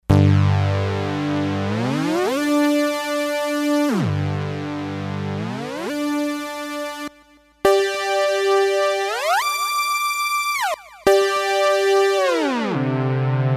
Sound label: Bend me 5ths, Roland D-10; Factory Patches